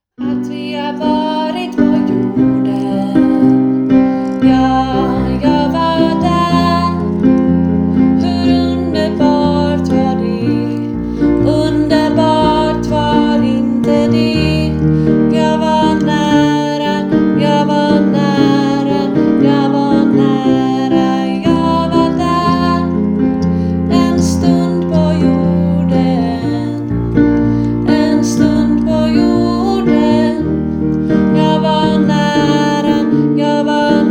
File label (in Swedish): En Stund Pa Jorden ALT stamma